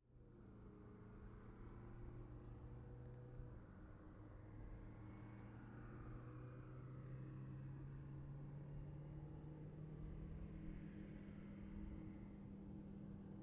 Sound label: Bus Exterior In By